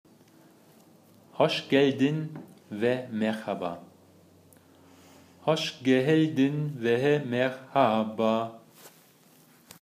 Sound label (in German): gruessdi tuerkisch text musik